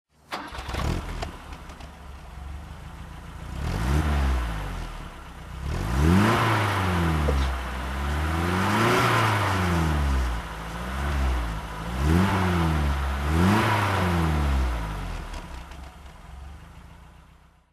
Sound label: bmw 2002 tii throttle, BMW 2002 Tii rally car; 1972; Engine sound; V3D/ ClassicarImages; Marc Vorgers